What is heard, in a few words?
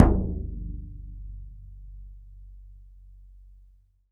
BODHRAN, Celtic Percussion; Drums&Percussion; E-MU Producer Series Vol. 7 – Old World Instruments